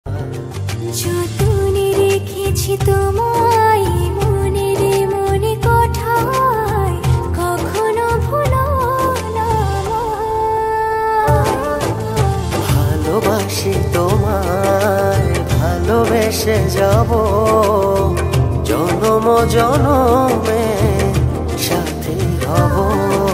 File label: Bengali Ringtone